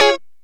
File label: Lounge Guitar Chick Major C#3; Zero-G Kocktail Kollection